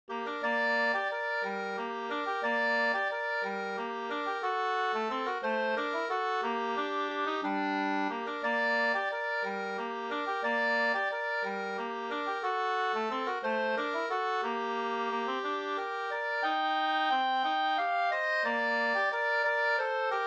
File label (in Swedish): vals g moll 2st